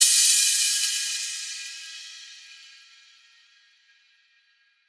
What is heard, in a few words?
drum cymbal crash; Virtual Riot; Drum Hits